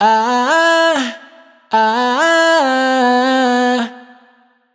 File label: riff ah 140 C wet, Vocal; Slate Digital Omnivox Vocal Sample Pack